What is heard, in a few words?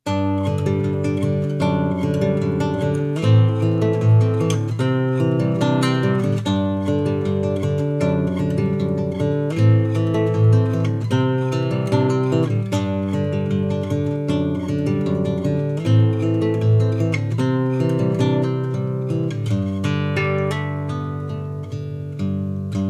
Folk Picking